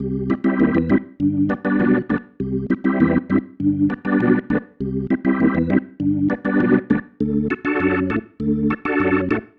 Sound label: Progression, Organ, Loops